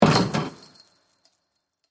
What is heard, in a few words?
Basketball Net, Sports, Swish; Chain; Shot, Backboard, Rattle; BlastWave